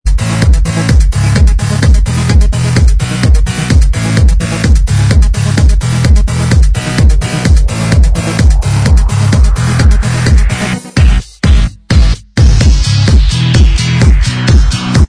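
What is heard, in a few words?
dance remix instrumental track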